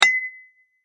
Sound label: Sports Softball Bat Hit Ball Ring Aluminum; Softball Sound Effects; Softball (Sound Effects:Sports); SFX Bible 6